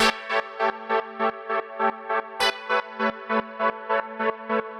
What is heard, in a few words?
Pad 100 A, sidechained